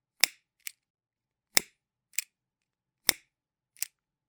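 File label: lighter sound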